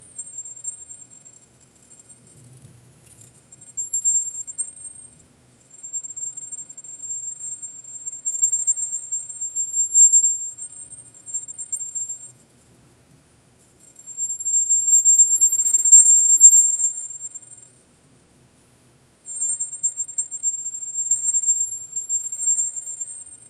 sword clashes ring faintl

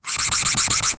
sound of a single straigh, stationary

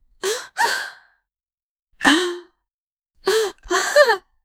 surprised and scared woma

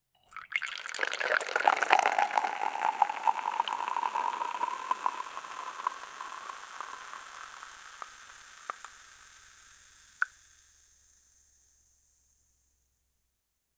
pouring hot coffee in an; beverages

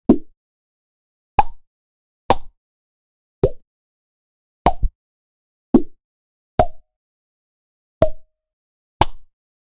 cartoon object drop sound

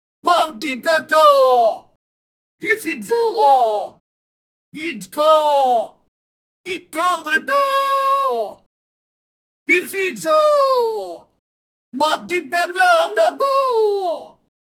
kazoo victory phrase; musical instruments

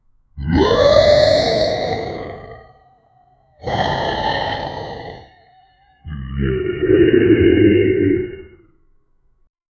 zombie

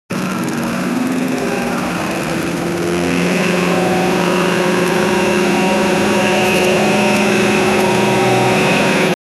old plane engine sound; aircraft engine

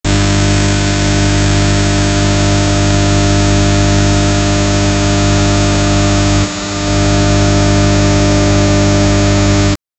electrical sound of high volume

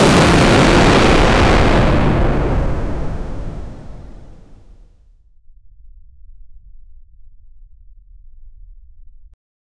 chemical explosion sound

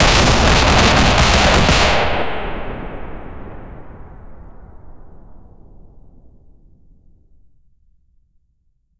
gunfire bursts suppressed

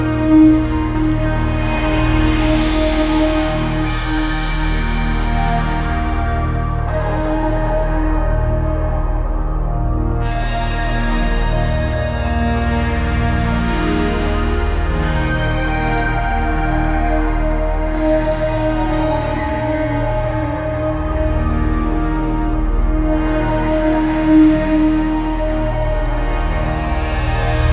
futuristic cyberpunk soun, main menu

